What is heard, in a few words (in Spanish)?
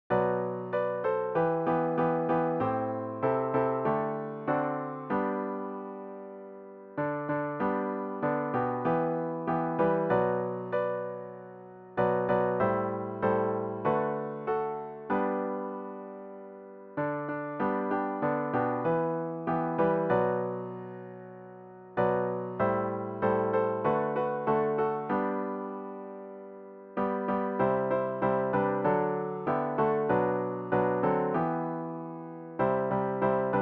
piano; Cantata para SATB, Solistas y Narración